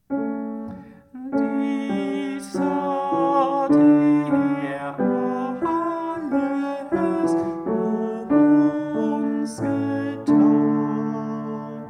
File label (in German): Das hat er Tenor